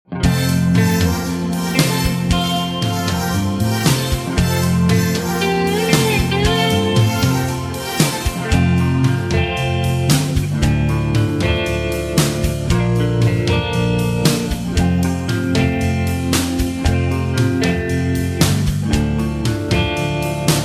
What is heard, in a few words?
F No Backing Vocals, backing tracks